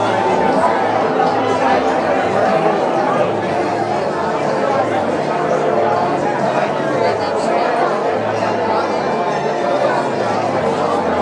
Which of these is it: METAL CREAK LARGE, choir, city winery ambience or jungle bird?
city winery ambience